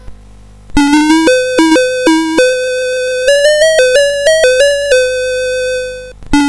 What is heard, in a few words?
BASIC, CSG8580R5